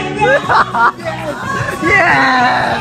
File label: hahaha yeahhh